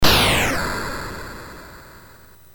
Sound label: BASIC sid MOS6581R4, GAMES; Sword of Fargoal